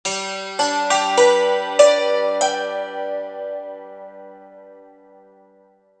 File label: Dulcimer